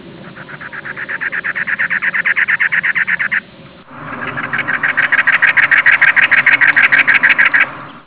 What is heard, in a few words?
cactus wren